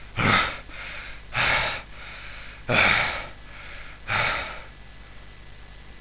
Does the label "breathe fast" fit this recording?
Yes